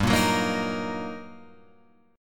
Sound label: G Minor Major 7th Sharp 5th, G, down, Guitar, Open F; GmM7#5 strummed; JGuitar